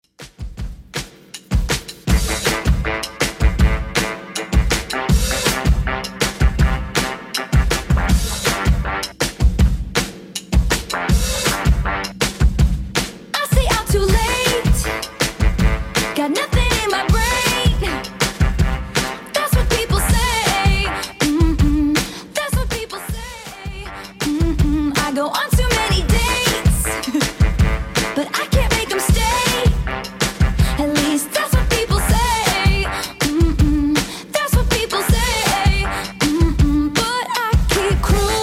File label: 2ks Redrum 160bpm